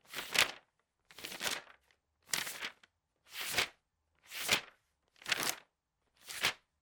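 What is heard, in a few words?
page turning sound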